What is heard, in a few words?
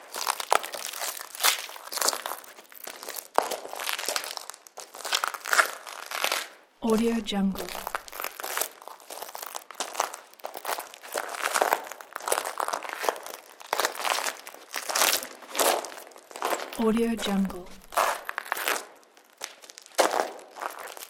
footsteps human walking on broken glass loop